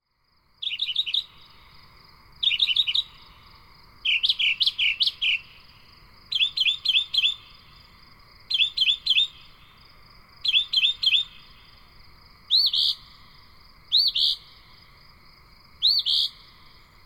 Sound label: Mocker Crickets